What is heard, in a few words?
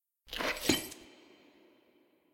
workout squat in